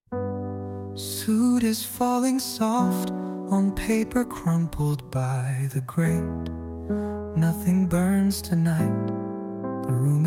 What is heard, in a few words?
lullaby, name songs